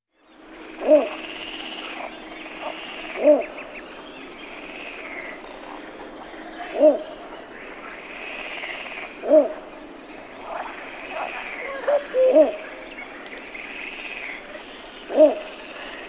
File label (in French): Blongios nain